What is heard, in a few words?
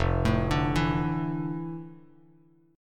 F Major 13th Bass Standard; arpeggiated; JGuitar